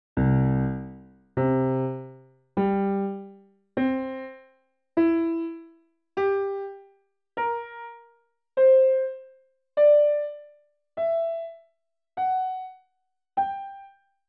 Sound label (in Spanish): armonicos